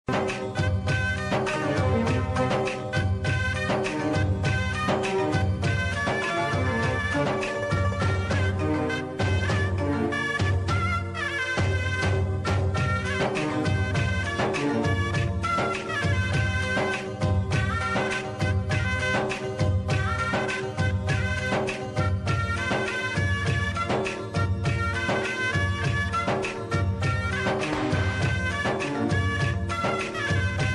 song bgm kannada ringtone love bgm; ak 47 movie

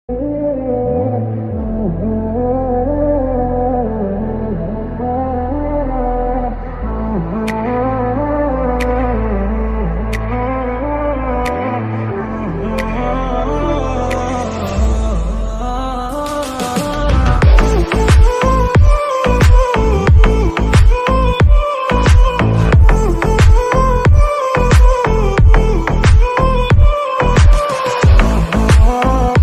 remix ringtone bus boosted ringtone car ringtone

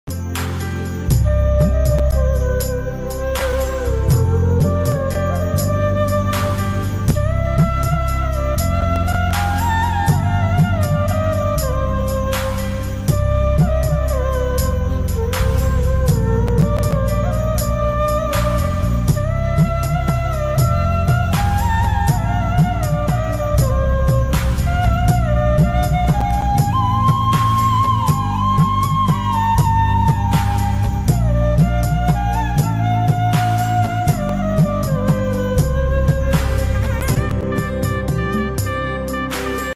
flute bgm tamil; kochadaiyan

